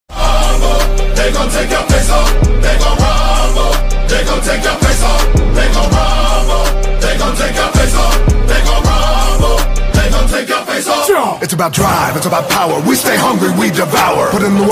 Rap

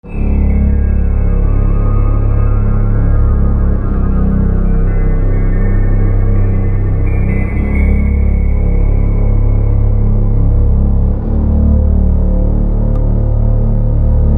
dark ambient music

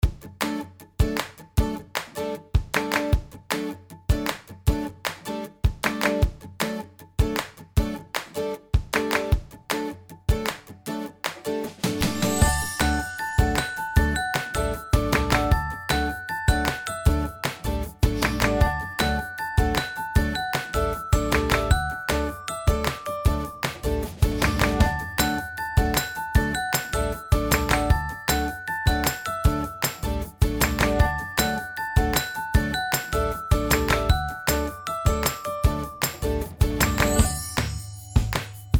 Happy Upbeat Clapping

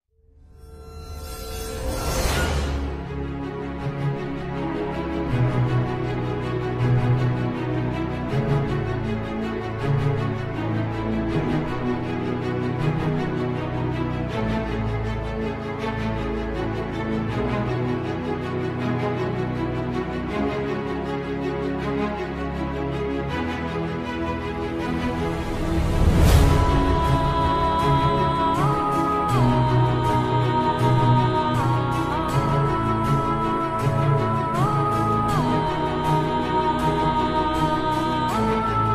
Tormented Instrumental; SPECTRUM MUSIC

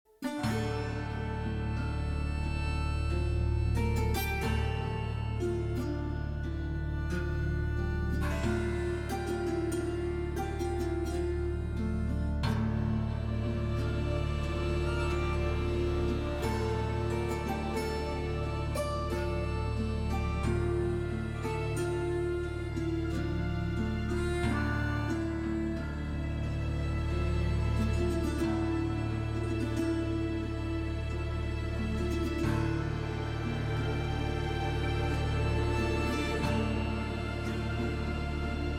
Sad Ambience Slums Extended; The Witcher 3 Wild Hunt; Unofficial Soundtrack

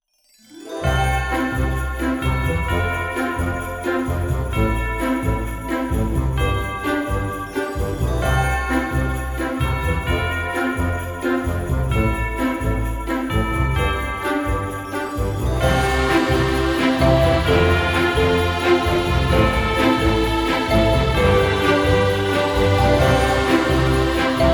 happy christmas

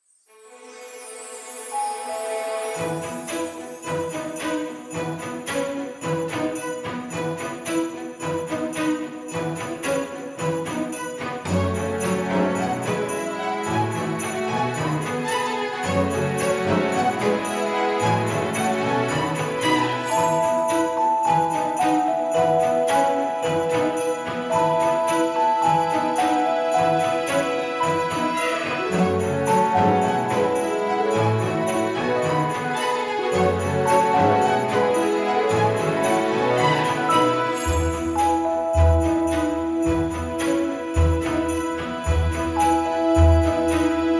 christmas knocking to the door

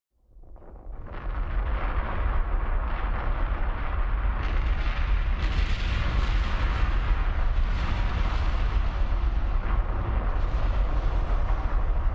Volcano Eruption Sound Effect

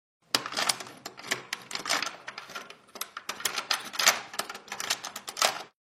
House Door sound horror door opening door noises

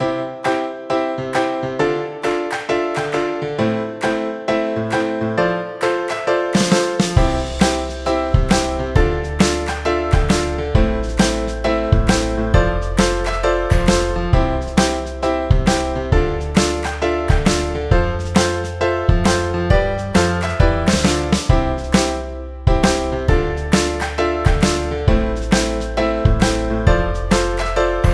happy jingle

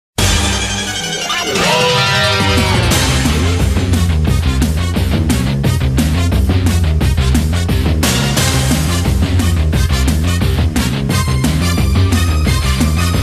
BATTLE MUSIC

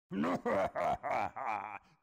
bowser laugh; mario party 7

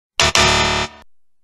incorrect sound effect

